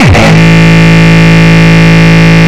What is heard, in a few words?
Longkick, kicks